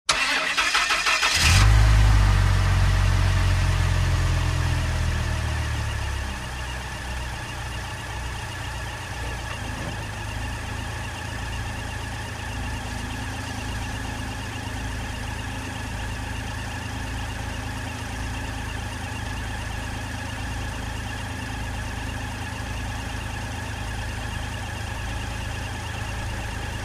Truck With V-10 Motor Start, Idle And Off With Nice Motor Hum, Dodge Ram